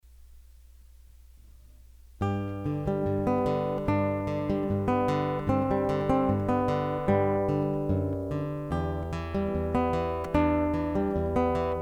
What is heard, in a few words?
Alternating Bass Etude